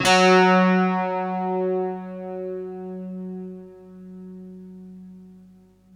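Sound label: slow strings guitar; Optical Media International - Sonic Images Library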